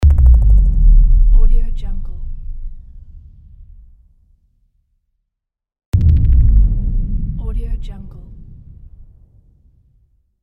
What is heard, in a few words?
alien boom; transitions and movement; بووم بم